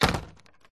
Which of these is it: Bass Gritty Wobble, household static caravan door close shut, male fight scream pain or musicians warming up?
household static caravan door close shut